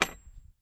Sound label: Bolt Lock; 99Sounds; The Warehouse